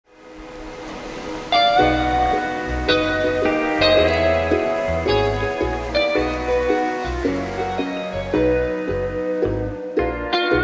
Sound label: Music Islands